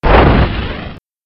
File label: missile fire